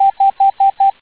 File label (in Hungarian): Távíró 5